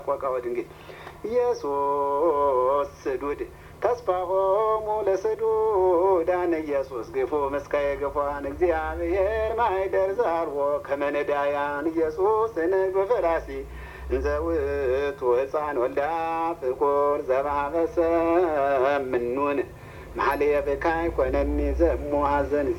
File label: seqo dingel, zema; ahlet yared; zewerha tsige; tekimet 17; 1 tekemet beal estiphanos